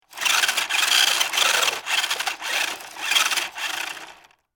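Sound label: Radio Controlled Electric Car Running, close up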